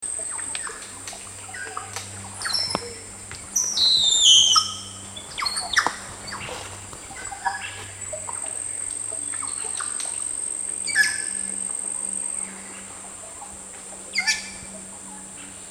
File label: Band tailed cacique